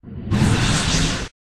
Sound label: Dark Spirit